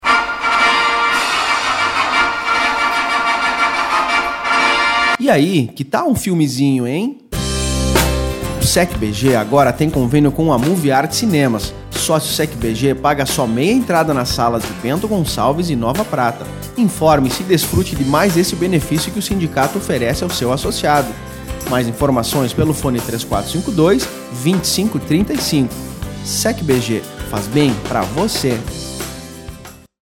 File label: Jingle